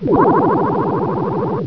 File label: DROWN; sonicnew